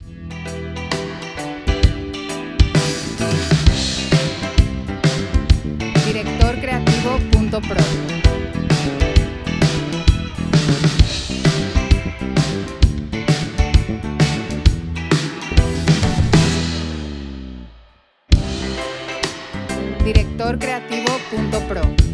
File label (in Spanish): 131bpm, 4/4, Em